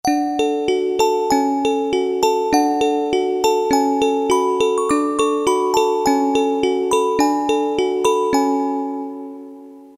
Celesta, Roland MT-32

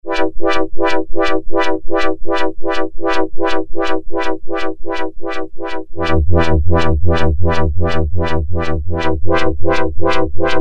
Rolling Wah, Roland Juno-106; Factory Preset

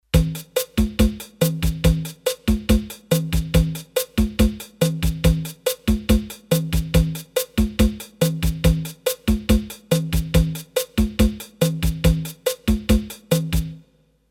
Mambo, Roland D-10; Rhythm Demos

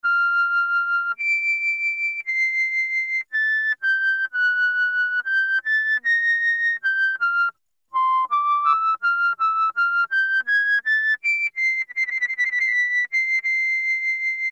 Piccolo, Roland Juno-106; Factory Preset

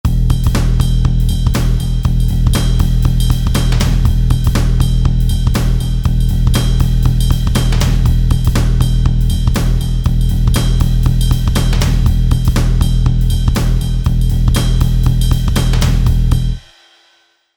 Hard Rock

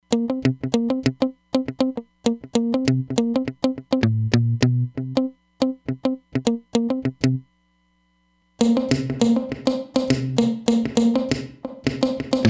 Muted Guitar, Roland DEP-5; Factory Patches

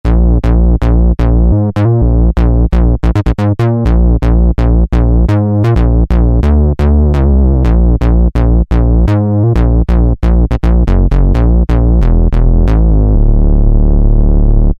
Korg microKORG Detune Bass; Factory Voices

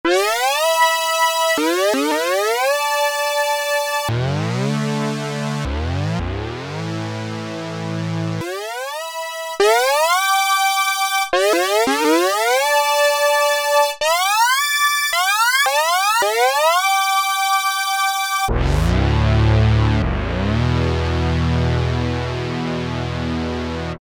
TUNED PORTAMENTO, Oberheim OB-8; Factory Patches

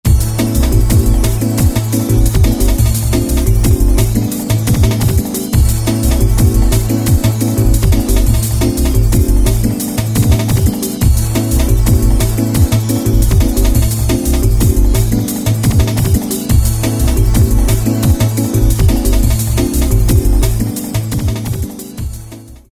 Jungle Pattern, Roland MC-303

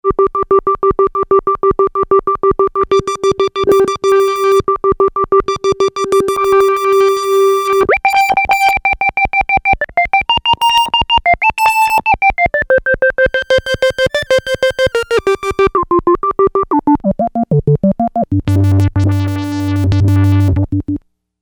Lead, synth

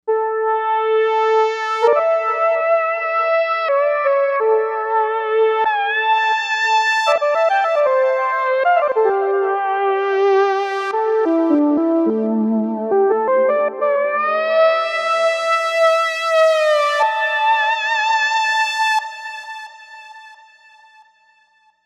GR-300 solo, Novation UltraNova; SuperNova Soundpack Volume 2

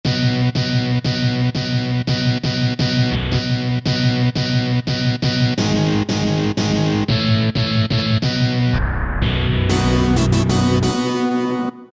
GTR Power; Roland SR-JV80-11 Techno Collection

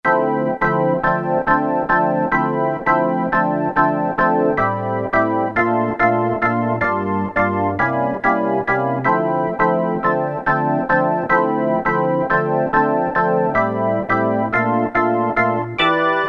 ORGAN

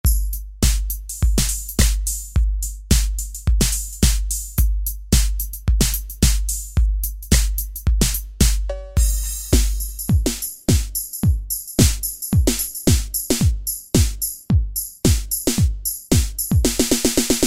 EDrum Kit

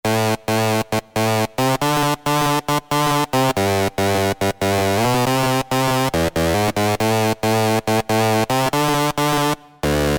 Shim Bass; Novation XioSynth 25

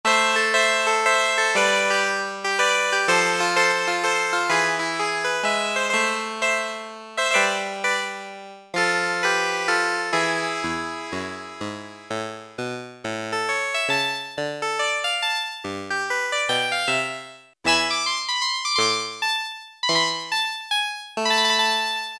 Kawai SX-240 HARPSICD

